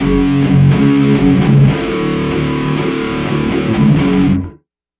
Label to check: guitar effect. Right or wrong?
Right